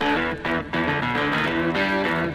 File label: guitar angry, Loops, Phonky